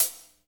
HH, KIT Real-Kit; 90 s Sample CDs; Sound & Vision - Gigapack I CD 1 (Roland); Real-Kit s11

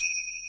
Internal Drums, Roland JD800

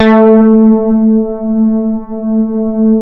SH 1000, BASSES

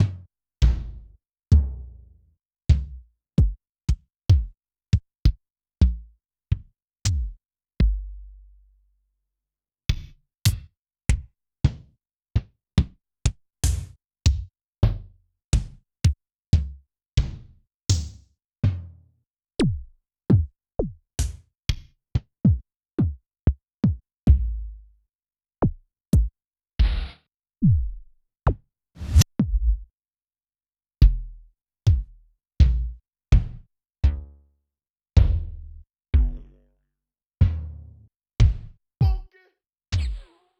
Yamaha DTXpress-II Kick

